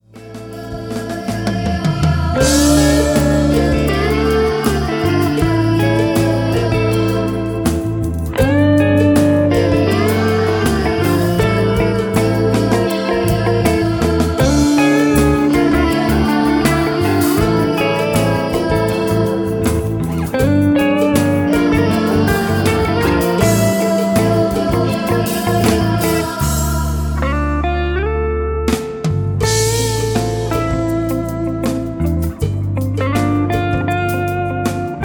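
Relaxing Guitar, instrumentale gitarren und panflöte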